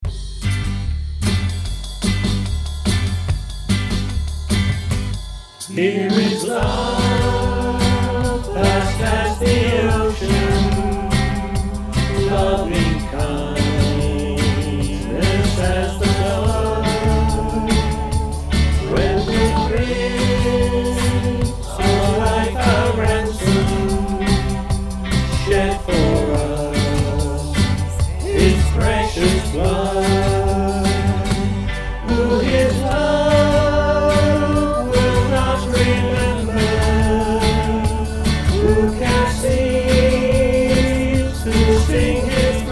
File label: choir; Here is love